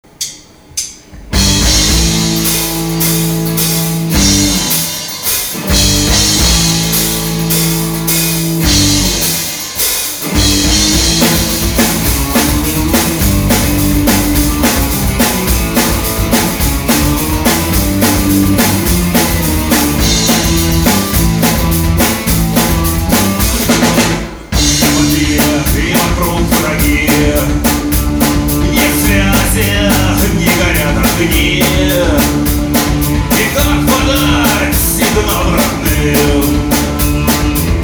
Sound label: Mandarin live